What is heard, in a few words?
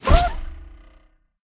Globe Bird Special Activation